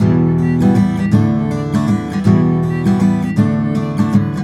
EM GTR, Celtic Flavours; USB Soundscan vol.42; AKAI

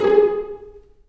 VLN PIZ; AKAI S-Series CD-ROM Sound Library VOL-2

